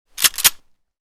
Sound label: Pump, Super Shorty, Firearms; Weapons